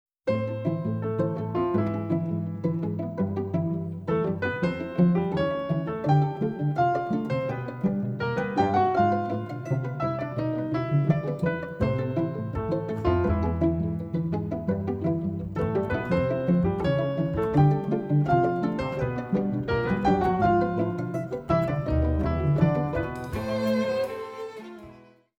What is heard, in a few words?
tango